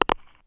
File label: connect, gui; TeamAlligator